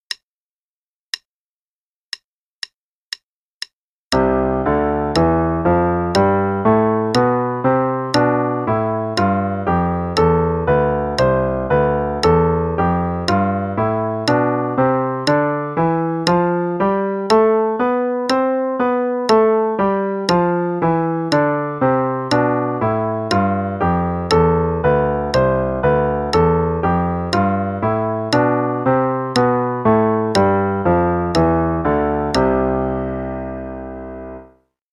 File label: Scales C Major, 2 oct Russian style; Piano; qn=60